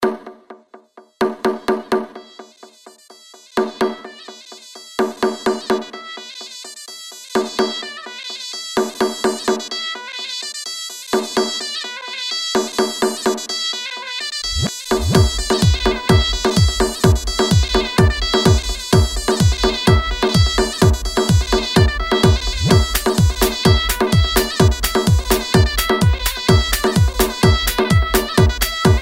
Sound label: Turkish REMIX